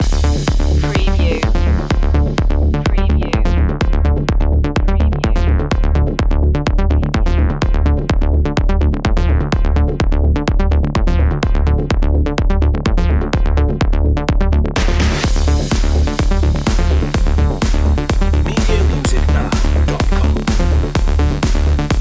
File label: body building loop